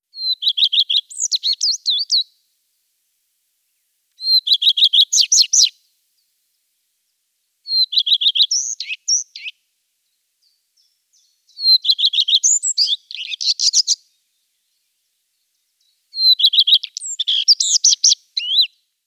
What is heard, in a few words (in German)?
Gartenrotschwanz Phoenicurus phoenicurus